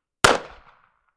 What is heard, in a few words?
Gunshot